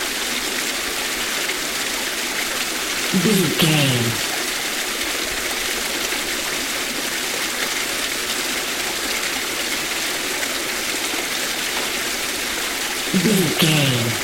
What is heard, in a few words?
river stream flowing water loop long version